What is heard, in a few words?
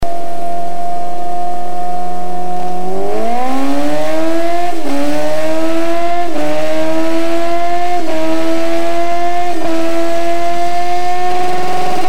Suzuki GSX1300R Hyabusa micron